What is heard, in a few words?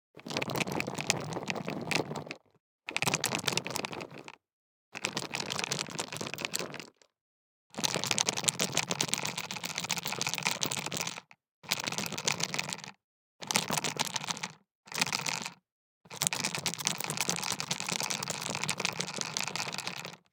DICE in Leather Cup, Shake, Six Dice; Samples; ArticulatedSounds; DICE Sfx Party (Extended)